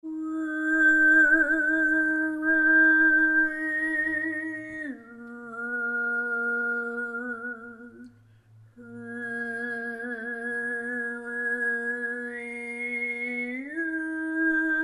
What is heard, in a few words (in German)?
Andachtsjodler